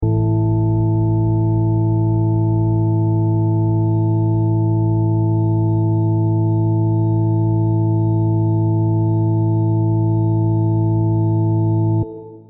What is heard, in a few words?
tuning pitches